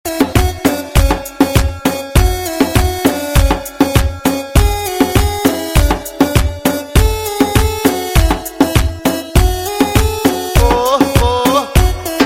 beat remix arabic, ringtone

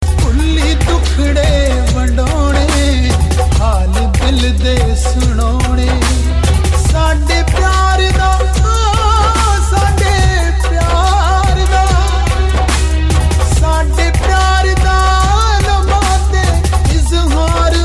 punjabi ringtone

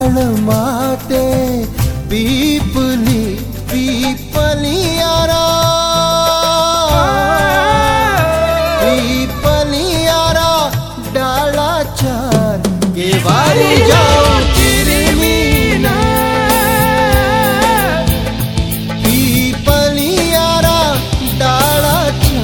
folk music

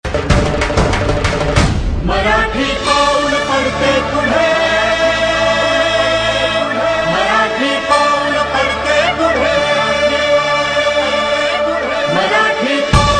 marathi

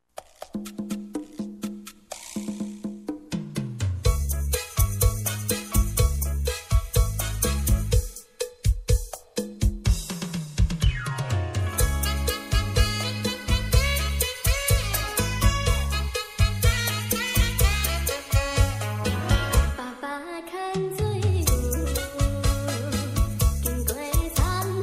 taiwanese ringtone

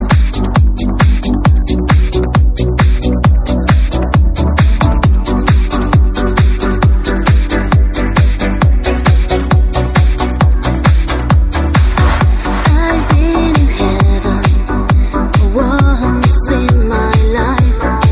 cool dhivehi ringtone

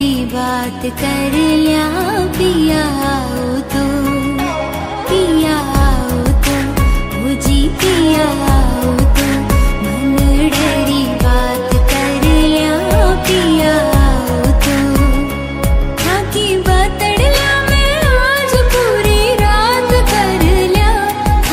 rajasthani song ringtone

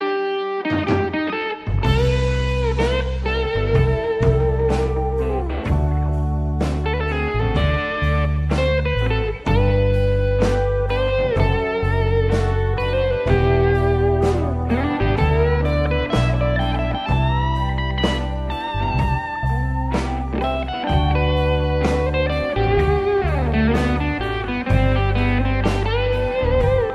japanese ringtone

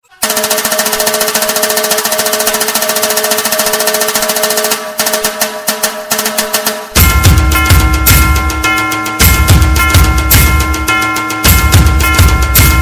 Nashik dhol Marathi ringtone

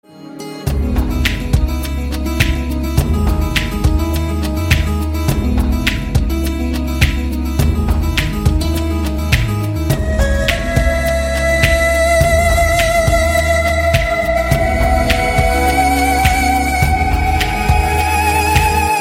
musical ringtone

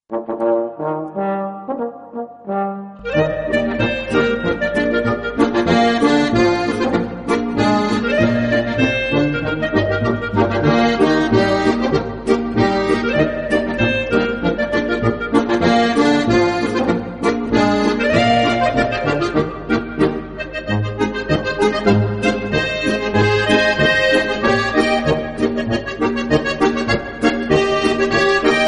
polka traditional

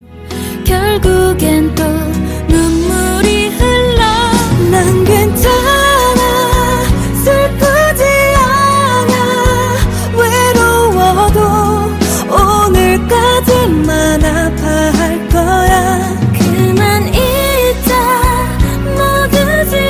ringtone

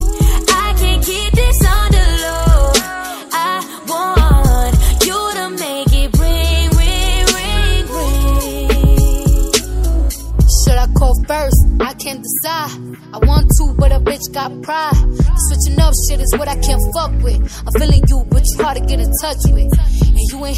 ring english latest ringtone

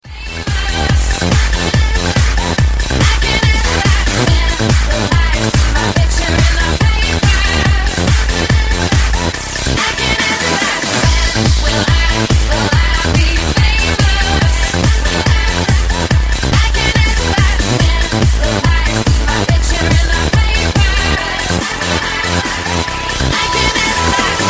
famous jazz ringtone

